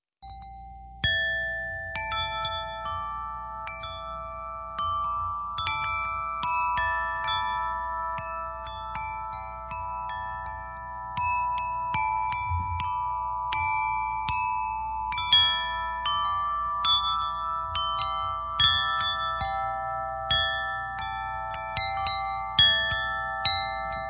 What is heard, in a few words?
Earthsong Chime